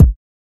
short reverb kick single hit a sharp key; kick drum samples

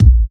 good kick sample a sharp key

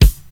trebly bass drum sound g key

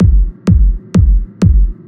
techno reverb clean kick; drum loops

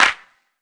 subtle reverb clap single shot g sharp key

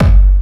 clean kick g key; single-hits-one-shots

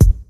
old school short rap kick drum single hit f key

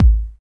bassy bass drum one shot A key; kick drum samples

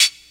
natural hihat C# key; hits one shots; hat samples; C sharp

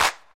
natural hand clap c sharp key; single hits one shots

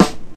00s natural acoustic snare sound g key; snare drum samples

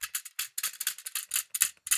small spring phone holder play hits

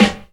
damped acoustic snare g sharp key; snare drum one shots

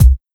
dry kick C key

